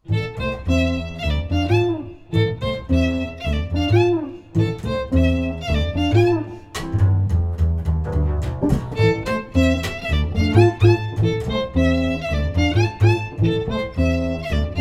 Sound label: Gipsy Jazz, String Trio